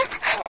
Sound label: Moan